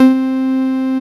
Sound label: SYN MELOTIC, dance synth; Sound & Vision - Gigapack I CD 2 (Roland)